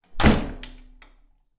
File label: door close wooden bathroom hard key in door swing; household; zapsplat